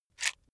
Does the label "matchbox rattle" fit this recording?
Yes